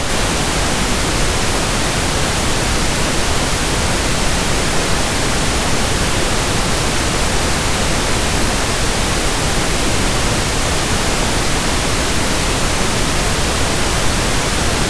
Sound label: pink noise decorrelated